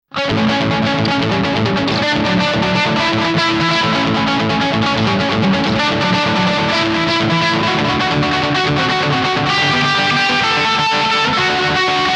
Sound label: Maxon AD9 Short Delay Effect